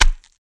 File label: Corn Snap High Low; gore stabs & eat